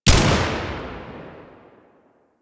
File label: Flak Cannon Fire 2D C; Grineer Death Squad